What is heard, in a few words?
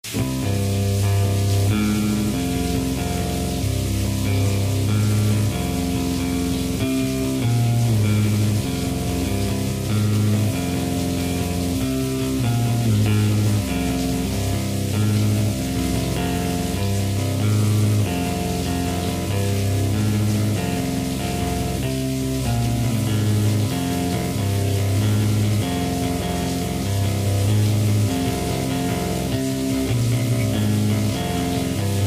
instrumental